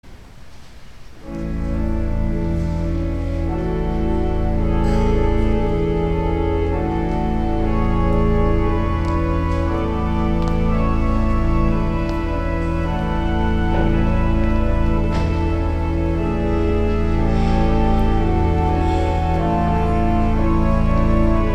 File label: Organ, Chorale Prelude; Marcel Dupre; 'In Dulci Jubilo'; St. John's Cathedral, Jacksonville Florida